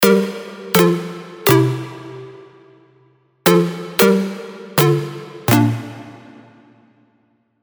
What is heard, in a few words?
Omnipluck; Nexus 3; KSHMR Expansion